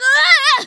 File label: Bail Female; Kelly Slater Pro Surfer; macOS, Windows gamerip